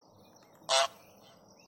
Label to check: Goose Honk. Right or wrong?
Right